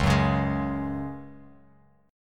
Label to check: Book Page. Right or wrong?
Wrong